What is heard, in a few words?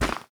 grass; anna; devour 2021 gamerip pc macos